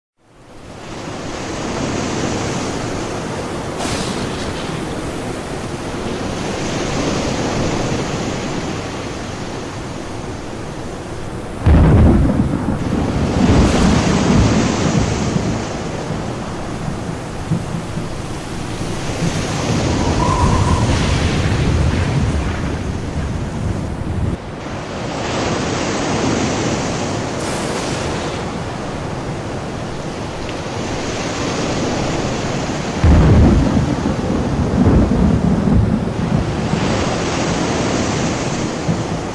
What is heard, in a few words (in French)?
ambiance tempete en mer